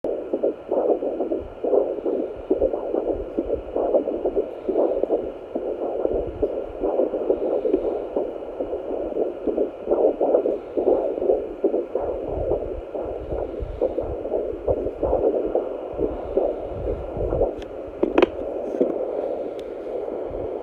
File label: 2nd Degree